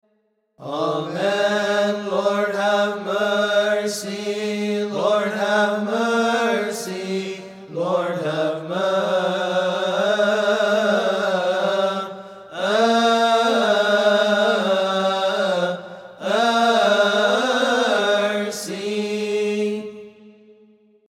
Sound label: Amen. Lord Have Mercy 3x